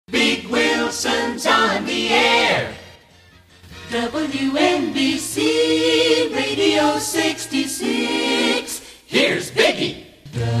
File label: Stereo Acappella Lifts; WNBC; TM